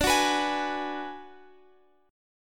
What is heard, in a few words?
D 6th Flat 5th, Guitar, Open F; D6b5 strummed; JGuitar